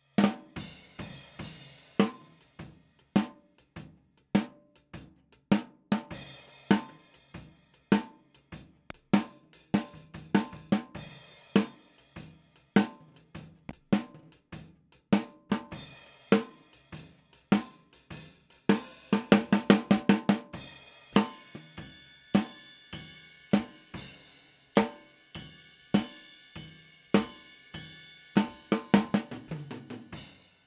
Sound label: Snare